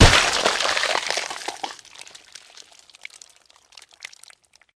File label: stab